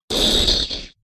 synthetic fart noise

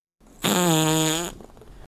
stinky fart on train

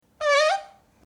squeaky fart in library

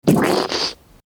short wet fart

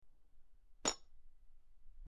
funny fart in bed

